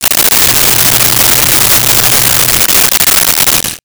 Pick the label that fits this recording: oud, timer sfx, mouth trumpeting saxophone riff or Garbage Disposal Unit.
Garbage Disposal Unit